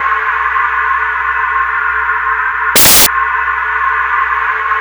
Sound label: CYCLONE, CYCLODRONE; Spectrasonic Distorted Reality 1